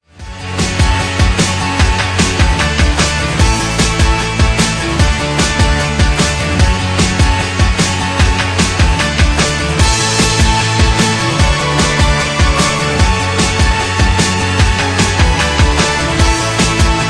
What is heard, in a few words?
Instrumental